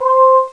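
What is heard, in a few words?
CLEAN BELL, ELECTRON; Easy Personal Web Page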